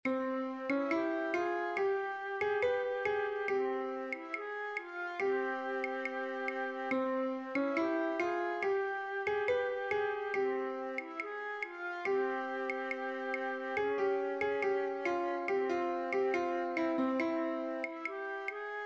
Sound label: Misirlou tutti, vielle a roue; stage de vielle a roue; stage2026